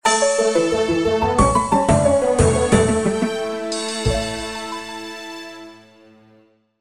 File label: offer complete jingle; Grand Exchange